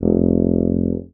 Contrabass Tuba Straight F0; DirectWave; Varazdin Orchestral

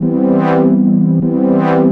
house synth 125 A, keys

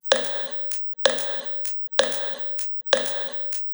perc 128, Electro percussion loops; Anthemic

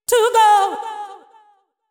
deep house female, 125, A, vocals

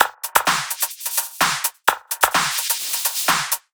tops 128; Anthemic.Electro; tops loops

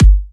Monster Kick, Drums, Kicks

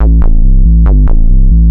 TECHNO BASS 140 A

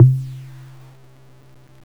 BONGO; KillerTweaksII; DirectWave

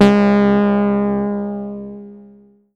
BELL G2; OBMX

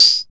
CR-78 TAM

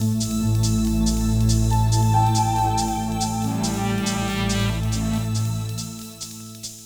SPACE PAD 140 A; SpaceSounds

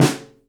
Overhead Flam; Drums; Legacy; Drum Kit 03

